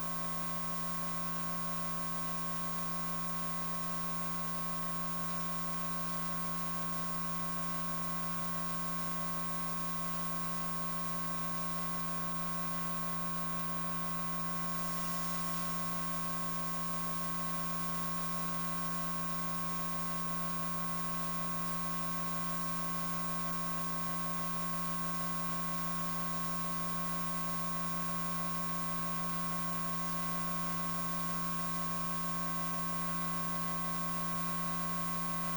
Dead Air Static Hum Boost

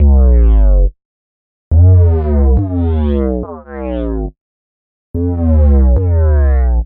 Whoah Bass 140bpm

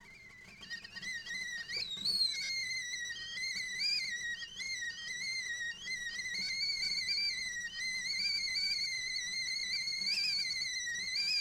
Kettle Boiling

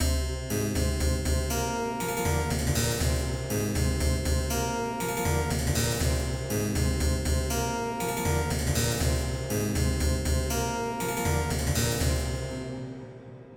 Baroque Intro Loop

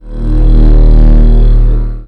Short Deep Humming

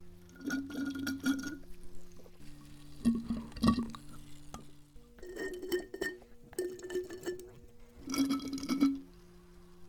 Slurping Soda

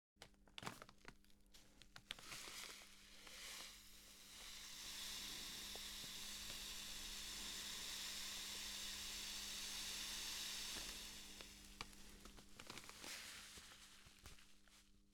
Pouring Sugar Into Ceramic Container

